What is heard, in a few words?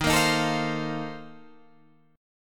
Bb Major 7th Suspended 2nd Suspended 4th